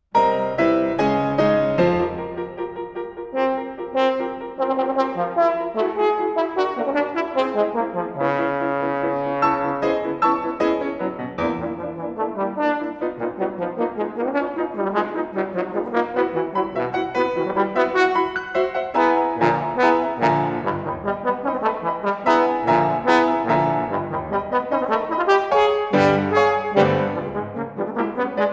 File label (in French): Concertino pour Trombone et Orchstre Presto